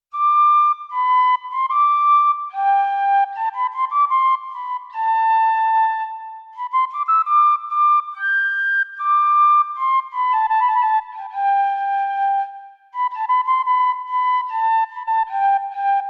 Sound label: Ryuteki, Aerophone AE-10